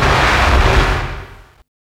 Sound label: CYBERGUN R, Hard & Loud Techno